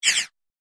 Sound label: Interface Swipe; Infernal Radiation